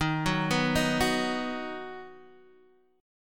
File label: Eb Minor Sharp 5th Eb down Guitar DADGAD; Ebm#5 arpeggiated; JGuitar